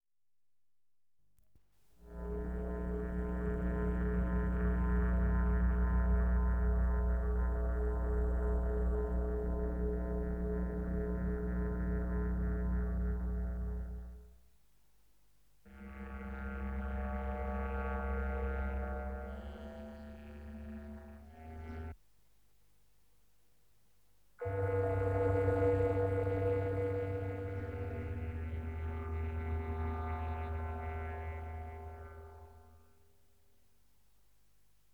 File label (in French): cloches imaginaires